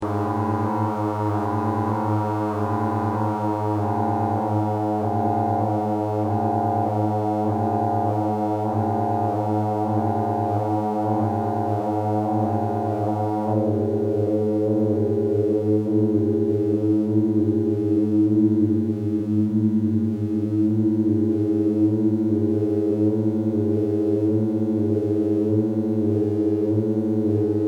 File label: Mmmmmmmm Maaaaaaaaa; analog synth